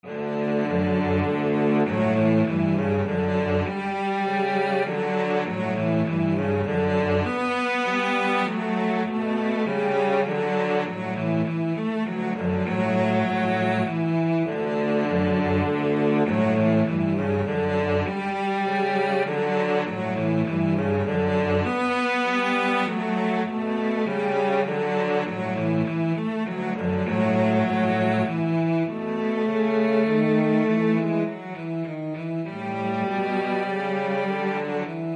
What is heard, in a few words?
VLC DUET, cello duet